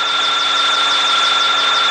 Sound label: ufo fly